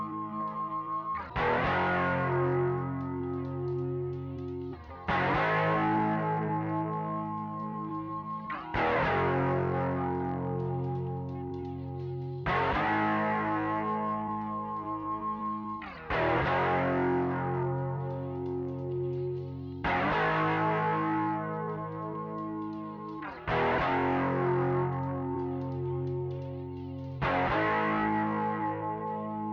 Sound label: Guitar Coda Chords